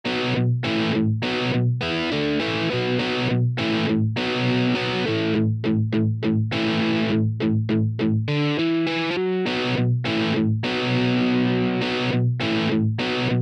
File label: Guitar exercises